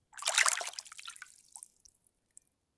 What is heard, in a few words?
Shallow Water Movement; children of morta